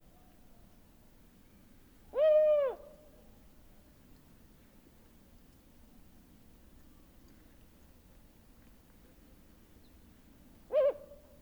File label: Mahgreb Wood Owl Compound Hooting Of Male Presumed Distance Calls Of Another Individu